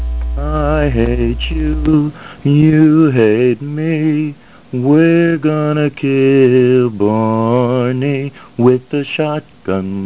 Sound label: barn burn